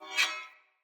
Cleaver Whoosh Accent, Swords; Weapons; Player; Bromius Cleaver

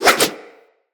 Axe Small Spin Swing; Weapons; Swings

